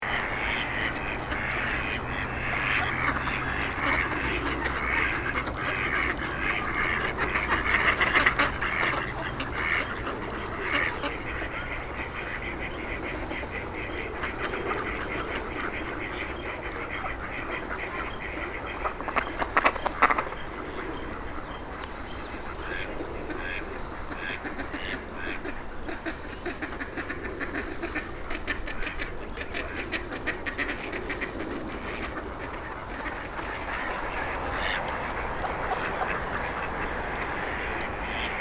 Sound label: Farm pond ducks ambience